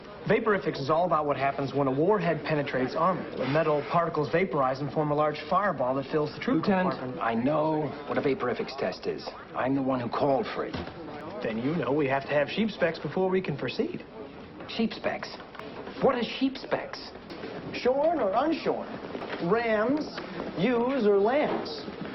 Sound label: Egg Chant; Sheep